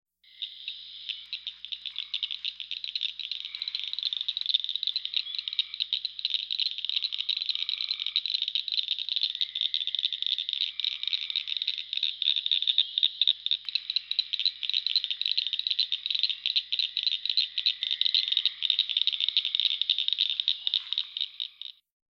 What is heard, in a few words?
northern cricket frog call